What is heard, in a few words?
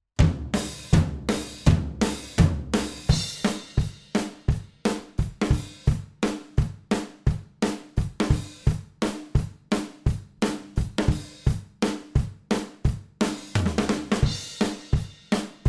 Drums full kit M-S